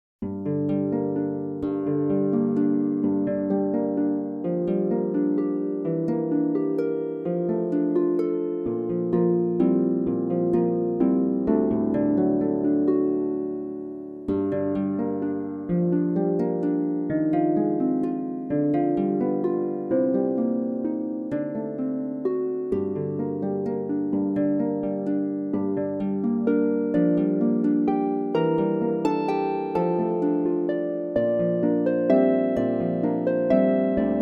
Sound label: sicilienne harp